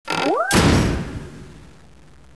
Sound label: mine; mice